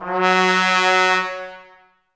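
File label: trumpets F#, brass; 90 s Sample CDs; Miroslav Vitous; Woodwind Brass Ensemble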